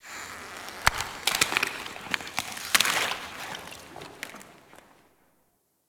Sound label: Ice Creak Echo; Ambience; Warframe